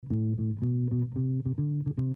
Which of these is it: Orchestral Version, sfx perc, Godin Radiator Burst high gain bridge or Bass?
Bass